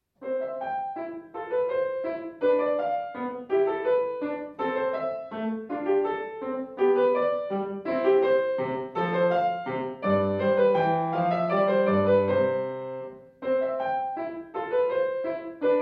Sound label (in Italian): Minuetto, klavier; Scarlatti